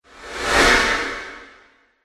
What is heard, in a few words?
Positive Whoosh Long Tail; Whoosh Sound Effects